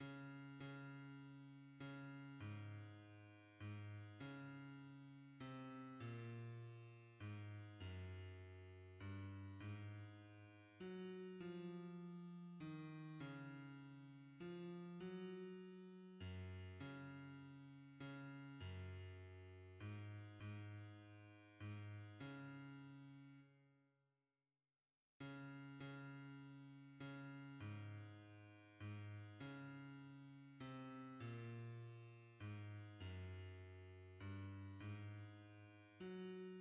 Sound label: hymns, bass; Oh what a debt we owe